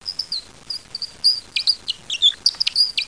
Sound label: SQUEAK